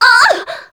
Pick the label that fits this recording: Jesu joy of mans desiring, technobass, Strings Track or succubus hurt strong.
succubus hurt strong